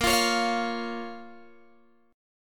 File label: Bb Minor Bb down Guitar Open G